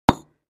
Patio Block Impact, concrete & brick

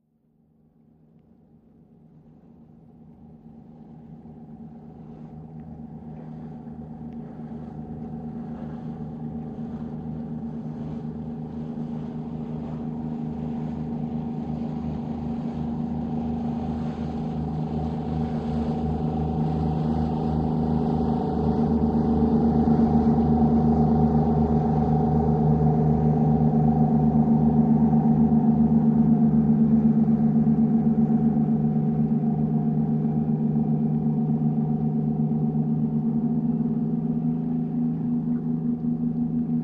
Military PBR Offshor

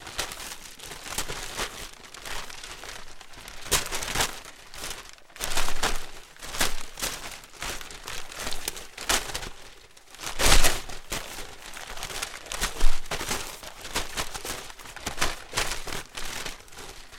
Paper Mvmt Hvy Wind

